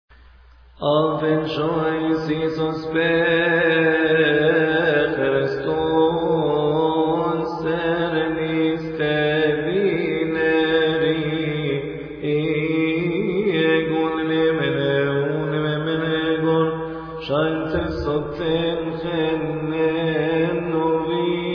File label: cymbals